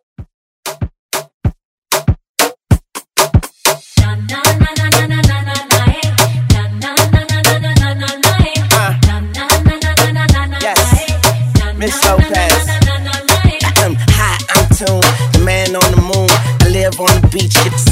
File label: Moombahton Redrum